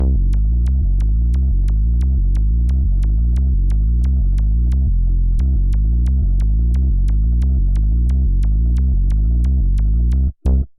Synt; TOB STUDIO

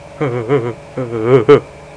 shiver; Sound Library 2000; Wayzata Technology; People

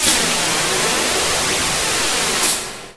roll; Earth and Beyond; EA Games

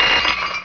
break

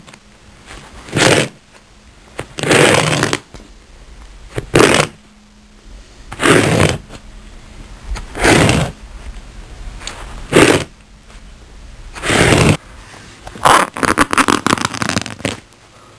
Ripping

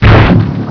fail, airfist, weapons; Quake; PainKeep

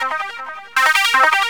SYNTH D+B 160 C, Drum+Bass, Keys; eJay

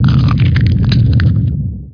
force grip; MOTS

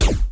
Laser; Guns; MicromanÃa 35

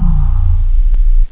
ohm, synth-bass; OctaMED SoundStudio V1

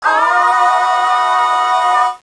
AAAAAH; Bordell der Begierde

